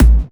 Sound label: Kicks; Vengeance Samples